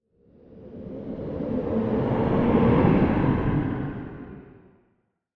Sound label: Sci Fi Spaceship; Spaceship Pass By Airy Low; Spacecraft